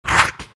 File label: big snarl; Undying